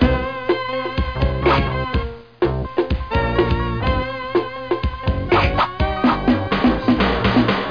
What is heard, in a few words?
Jam